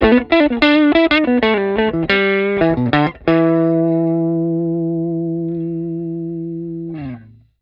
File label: Neck pickup through a 1950 Fender Deluxe, humbucker, lick; patent denied humbucker pickup